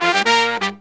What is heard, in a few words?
Pop & Funk Brass, 120